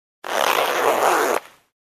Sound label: Clothe Bag Zipper close